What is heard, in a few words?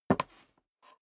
step dress boots wood creak single right; schritte; Footsteps; menschen